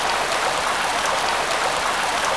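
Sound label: rain water; Mr. Wonder's Greatest Toyshop on Earth; OmniMedia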